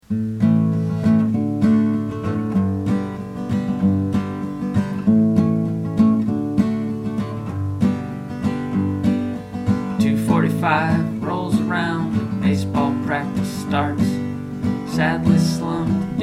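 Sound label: Guitar Time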